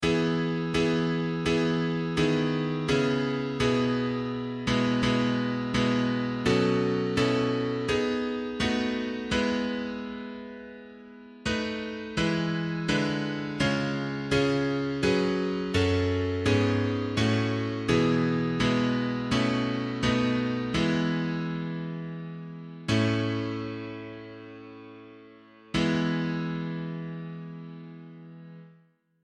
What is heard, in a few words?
Meditation; There Is a Green Hill Far Away